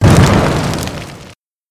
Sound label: hit fire